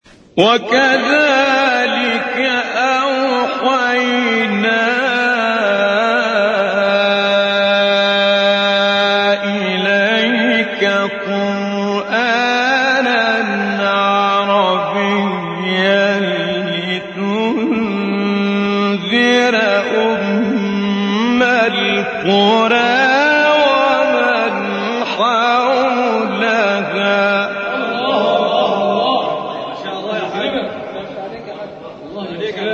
فراز زیبای تلاوت آیه سوره شوری; عبدالعال

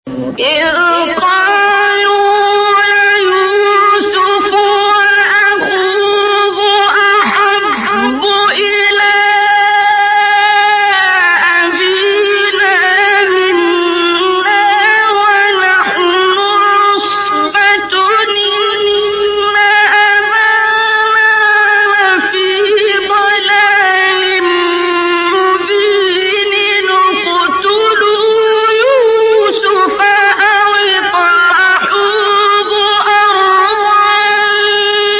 تلاوت زیبای آیه سوره یوسف; عبدالباسط

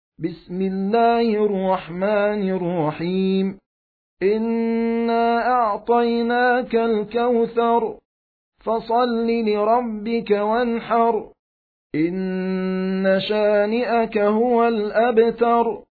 ترتیل; سوره کوثر

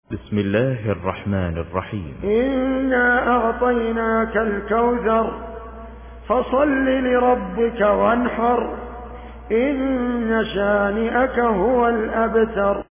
ترتیل بروایت حفص عن عاصم; سوره کوثر

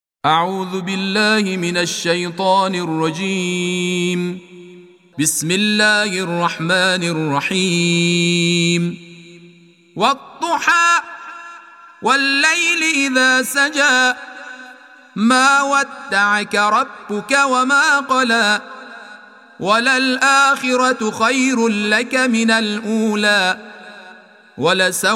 تلاوت ترتیل قرآن کریم سوره ضحی

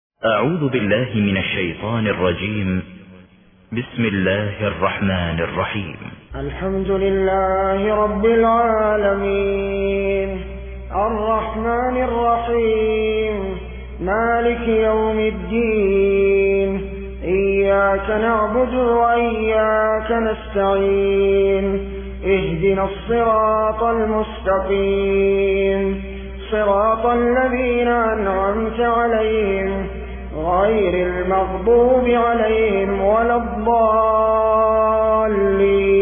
ترتیل بروایت حفص عن عاصم; سوره حمد

